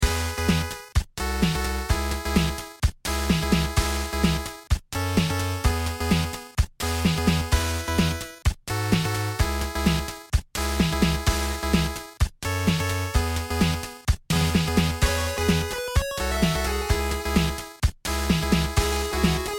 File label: beat